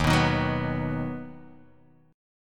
Eb Minor Sharp 5th Guitar Open D

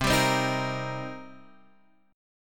C Minor 7th C Guitar Standard; Cm7 strummed; JGuitar

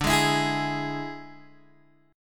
D Suspended 2nd Flat 5th D Guitar DADGAD

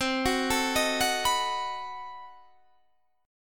B 7th C; B7/C; arpeggiated

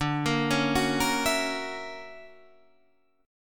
D Augmented Major 9th down Guitar Half Step Down

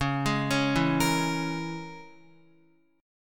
Db Minor 6th Db down Guitar; Dbm6 arpeggiated; JGuitar

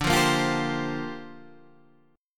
Bb 6th Add 9th D

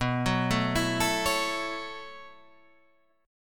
B 9th Suspended 4th B down Guitar Standard; B9sus4; arpeggiated; JGuitar

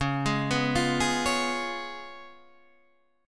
C sharp 7th C sharp, Guitar Drop D

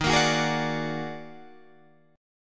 D sharp Minor Major 11th, Guitar, Drop D

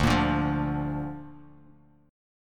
D Minor Sharp 5th D down Bass Standard

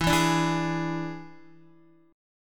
E 7th E down Guitar; E7 strummed

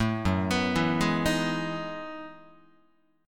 Gb Minor 13th Gb down Guitar; arpeggiated; JGuitar

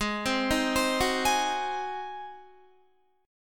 Ab 7th Ab Guitar 4F,4A,5C,5G,5C,6E; arpeggiated

